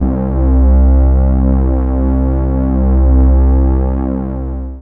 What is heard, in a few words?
Single Electric Basses, Dance Floor Bass